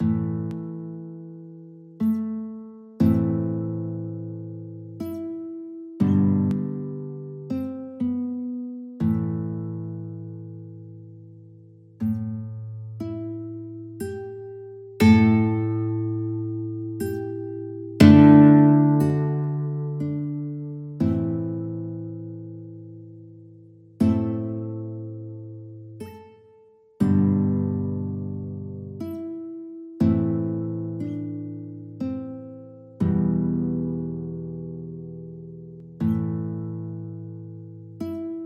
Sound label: scarborough fair, guitar